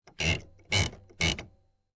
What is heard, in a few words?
typewriter paper roll up